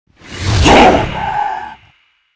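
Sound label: Leopard attack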